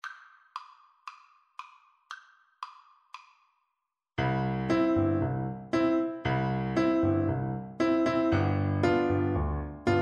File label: mango walk FL kar, Flute